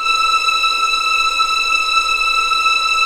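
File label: E6 legato piano, violins; East West; The Ultimate String Collection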